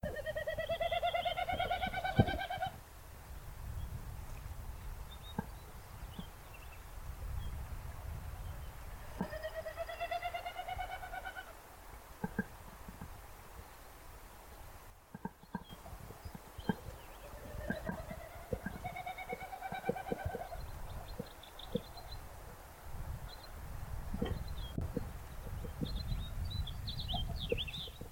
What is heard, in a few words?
Wilsons Snipe, Gallinago delicata; shorebirds rails